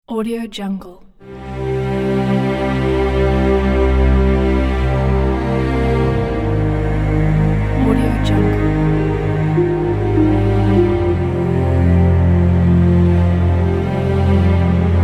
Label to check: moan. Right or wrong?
Wrong